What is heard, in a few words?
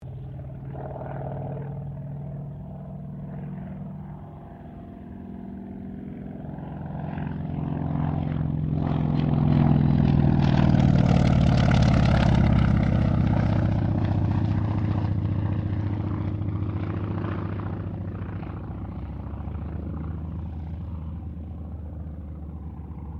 AIRCRAFT CA-28 CERES PASS; Airplanes; Vehicles; Aircraft Collection